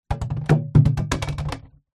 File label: container water plastic empty drop ground; foley